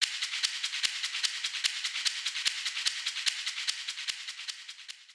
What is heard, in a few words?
Shaker